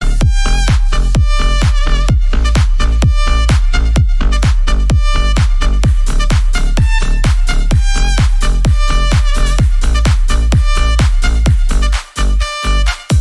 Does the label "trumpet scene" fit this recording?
Yes